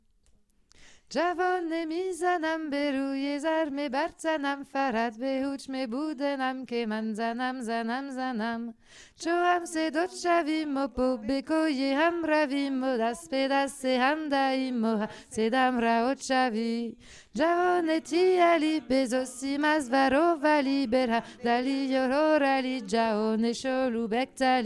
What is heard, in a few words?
alto; transmission par la Canaille du Midi